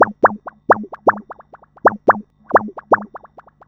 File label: Synth, Techno